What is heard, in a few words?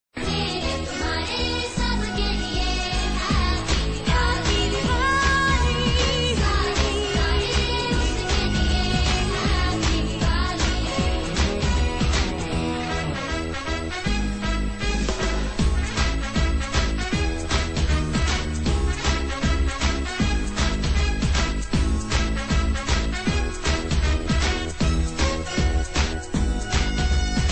Happy Diwali Song